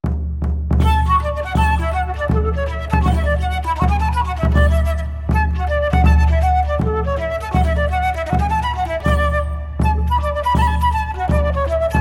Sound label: Beasty Flute; Devil Whiskey